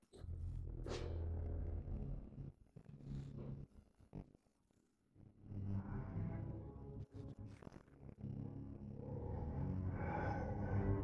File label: no vocals; htdemucs 6s